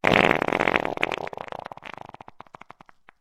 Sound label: fart longish; Farts